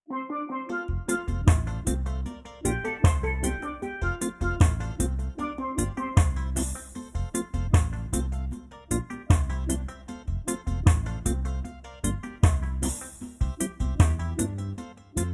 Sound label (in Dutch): instrumentaal